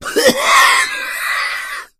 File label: smoker death; voice; source engine; left 4 dead 2